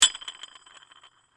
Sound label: Handle Screw Turn, Handle, Screw, Turn, Metal; Foley; Hand Tools (Sound Effects:Tools); BlastWave